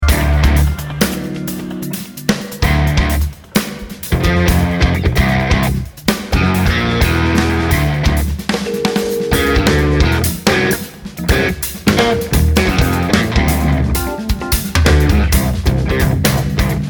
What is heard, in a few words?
Riff